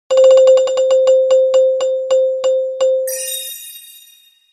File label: mystery prize roll; Highway B